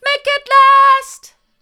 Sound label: hard, vocal